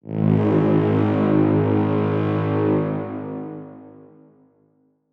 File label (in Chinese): 高塔号角音效; 2021西路背景音效